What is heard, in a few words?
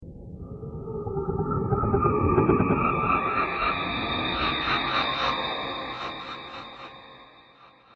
ringtone ghostly atmosphere